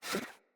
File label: Pet Alert Snort; Enemies; Catbrow; Pet Ship